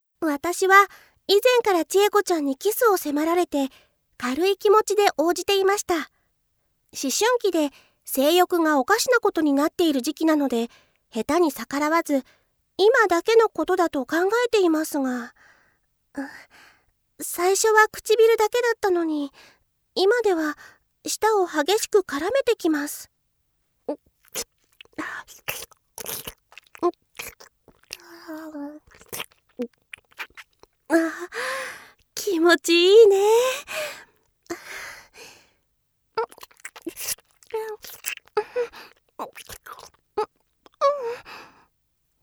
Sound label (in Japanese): 濃厚キス, 効果音なし; 百合関係ボイスドラマ